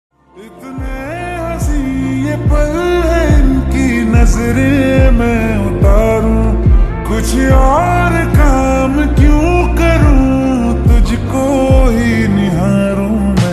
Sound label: Slowed Reverb Ringtone; bad news